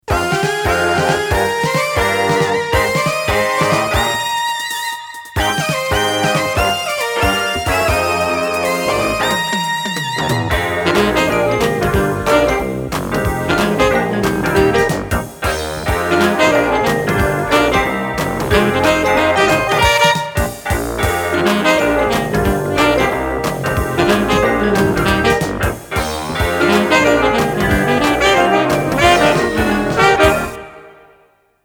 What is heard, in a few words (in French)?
Générique Jazz